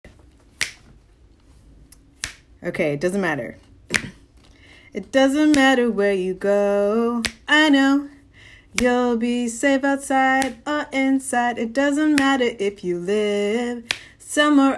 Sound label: Outside inside song; bailey gatzert